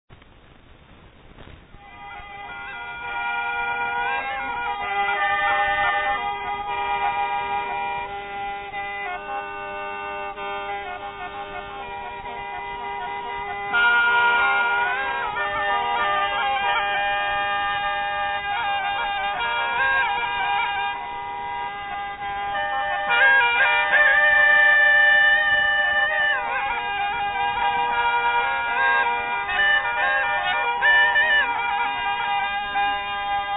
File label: Instrumental Music, Thai Meuy Folkdance Pi noi, Khen; Khamkeut